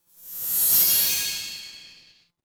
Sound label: magic scifi portal